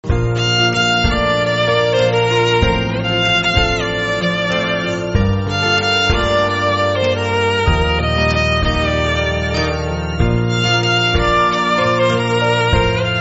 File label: love piano theme instrumental; aashiqui 2